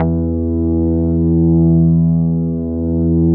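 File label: E1 ANA BS; Synclavier Sampler Library; KEYS AND GUITARS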